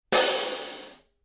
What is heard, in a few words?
masters 16 thin hat open, paiste